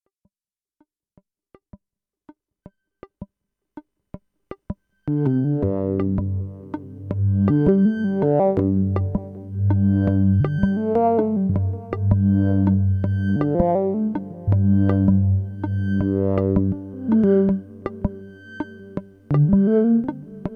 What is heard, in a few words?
LFOs Three Tracks